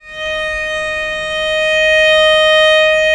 VL D#4, VIOLE, Historical Instruments; USB Soundscan vol.23

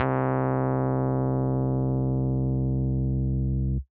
Classic Electric Piano C2; Big Fish Audio; Old Skool House; OSH Piano

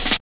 Hard Stepz Cut, Jungle, Drum Loops; Zero-G Jungle Warfare 1, 2 & 3; 90 s Sample CDs

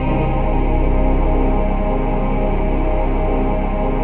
VOICEPAD; Best Service; XX Large Pads; Sample CDs

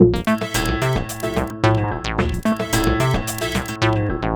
xtra Euro gruv; Sonic Foundry (Sony Creative Software); Ugly Remnants VOL-2

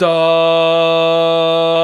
VOX, Male Scats

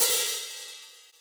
Ride; Equipped Music; Loungin House

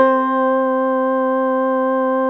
Guitar C5, Fairlight CMI IIe

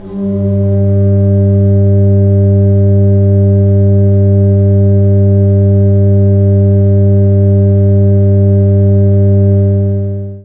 org a#2, Church Organ, AKAI S-612 + S-900; Retro Sampler

